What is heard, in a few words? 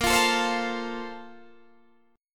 Bb Major 7th Suspended 2nd, Guitar, Standard; BbM7sus2 strummed; JGuitar